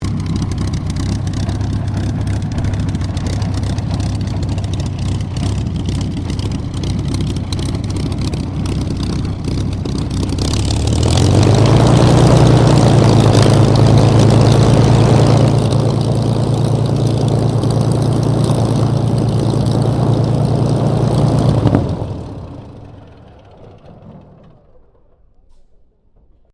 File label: AIRCRAFT SUPERMARINE SPITFIRE MK-XVI TAXI SHUTDOWN; Airplanes; Vehicles; Airplane Sound Effects